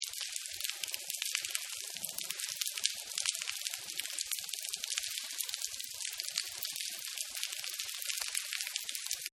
Rain Lite Concrete; Rain Hard Concrete; Weather; Partners In Rhyme

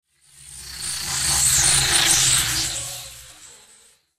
Sci Fi Energy Beam Pass By Zap Electricity Bolt; Laser

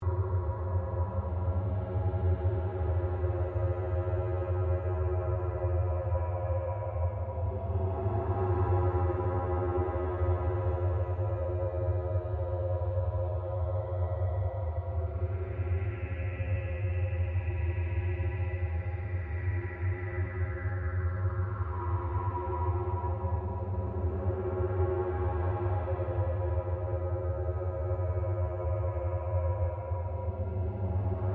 Created Drone Long, Drone Sound Effects; sound building blocks created through manipulation of raw material

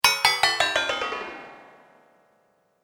Metal Magic Down; Magic Sound Effects

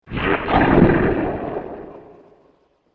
Alien Attack Beast; Alien Sound Effects; science fiction sound effect; star wars / trek style games

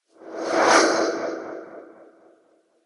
Swoosh Whoosh

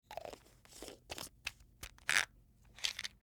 Pill Bottle, Replace Cap; Replace Child - Proof Cap On Plastic Pill Bottle; Bathroom; Household

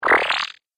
Laser Squelch, Laser Sound Sound Effects

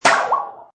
Metal Saw waffle; Metallic; Multimedia; Lynne Music

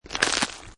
Snow And Ice Ice Cracking; also great as a bone break, breaking bones sound; Weather

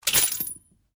RIFLE REMMINGTON700 7.62MM UNLOAD ROUNDS; A selection of domesttic firearms; Rifles; Guns

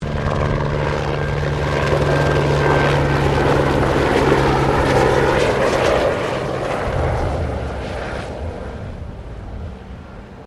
HELICOPTER BELL 204 FIREFIGHTER PASSING CLOSE; Helicopters; Sound Effects:Vehicles

